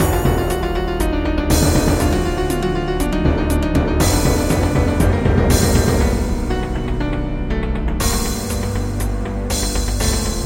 Doom Drum; Death's Hangover

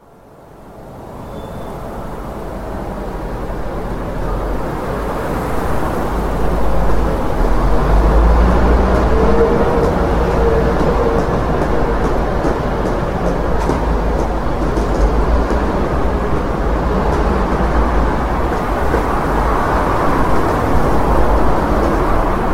City Ambience; Criminality

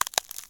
ice; CS Level2

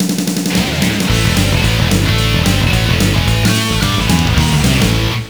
Checkpoint; Midnight Run Road Fighter 2; Konami ZR107; arcade

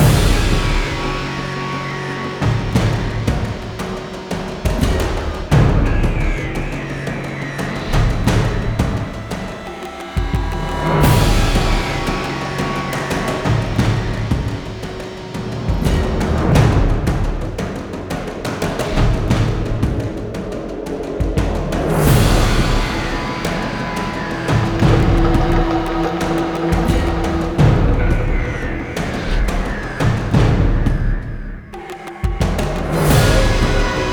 Perc Loop; Rage; Rednoteaudio; Jackal Valley Level 2

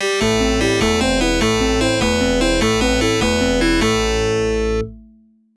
Forest Sting

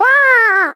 Waaah

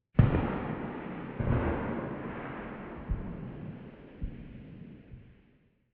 Explosions

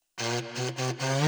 Mus Human Tsunami 120; from dust

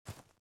run grass

